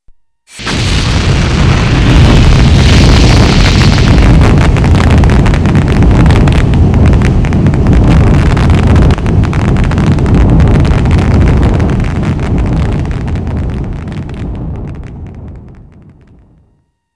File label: rocket takeoff